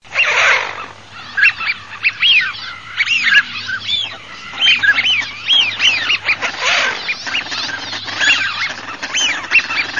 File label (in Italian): CODONE FISCHIONE